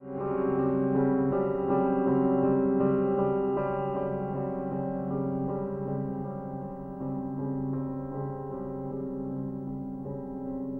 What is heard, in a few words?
Klavierstuck